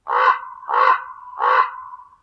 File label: Common Raven